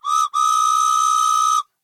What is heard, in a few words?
horn; Golden Crayon Sounds